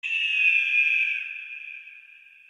eagle

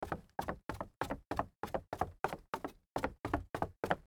Dress Shoes on Wood Stairs

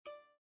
Windows Navigation Start

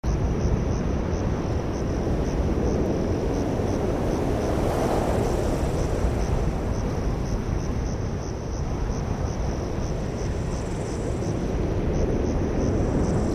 Canyon

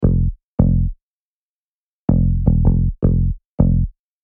Stinger

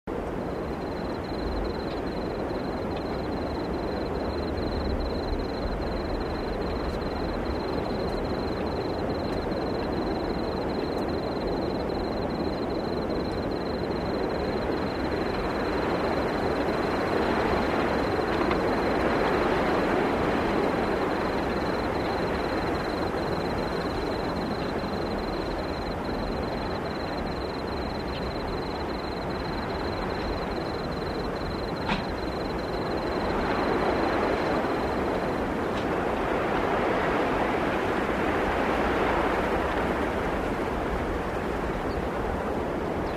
Amb Train Track Ext

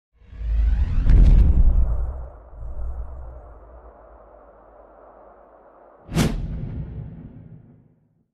Energy Boom; Adobe After Effects Intro

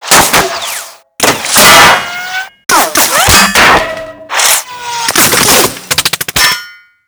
Hedge Impacts

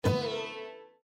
Windows Battery Critical

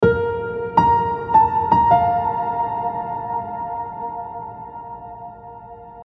DreamGrand

